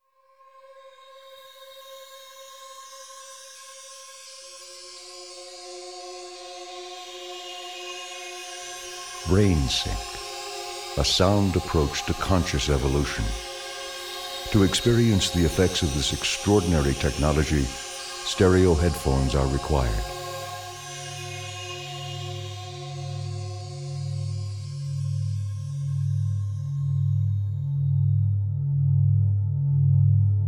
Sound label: Meditation Music, Delta, Beta, Gamma waves; Various Meditation Exercises for Stress Management, Anxiety, Insomnia & General Well-Being; sleep